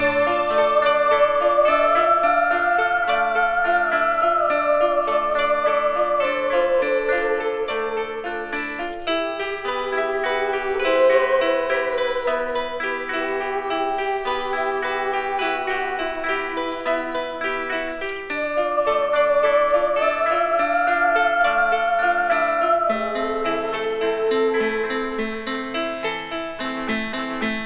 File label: piano, Loops Sad